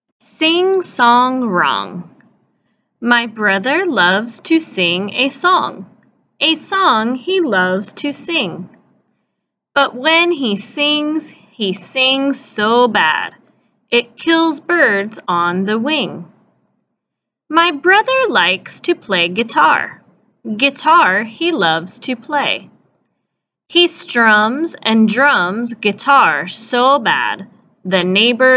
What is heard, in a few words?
singsong wrong